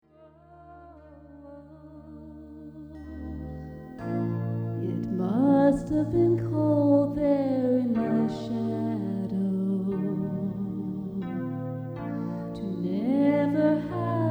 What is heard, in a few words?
Ballad